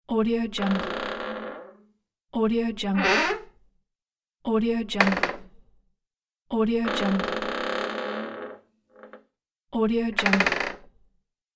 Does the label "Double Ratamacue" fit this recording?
No